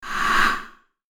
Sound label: Short Swoosh